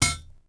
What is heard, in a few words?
pipe hit dirt; impacts explos